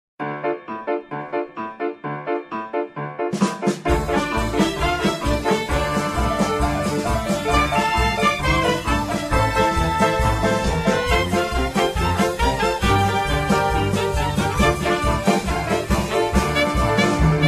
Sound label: klezmer band odesser bulgar; temple sinai